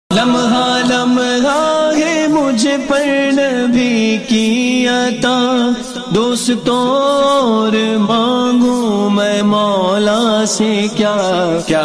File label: Naat Ringtone